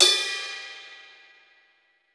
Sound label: Pop Ride Bell, cymbals; Roland BK-5